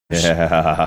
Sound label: yeah ah ah ah ah eah